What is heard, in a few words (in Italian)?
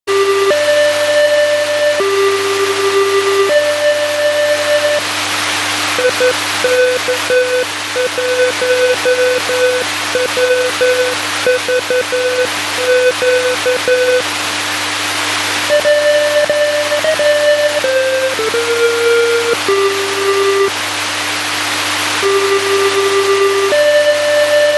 ricezione beacon